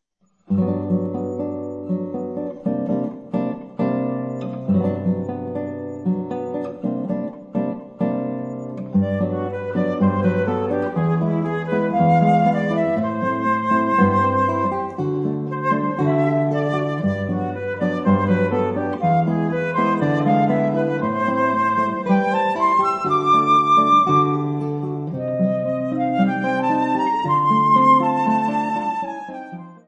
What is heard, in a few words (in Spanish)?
bossa para dos